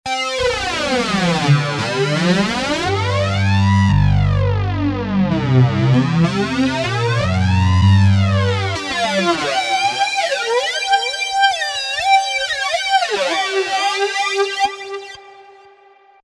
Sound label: Futile Flange, Roland V-Synth; Factory Patches